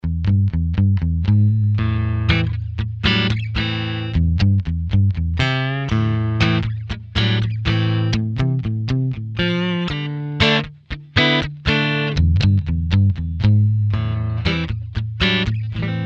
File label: neck clean; rolling mill